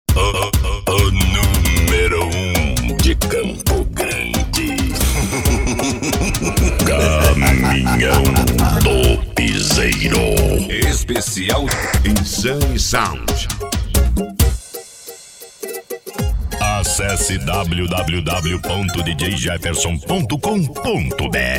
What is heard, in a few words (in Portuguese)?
caminhao do piseiro especial insane sound